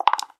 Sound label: Throwing Dice; Realme 8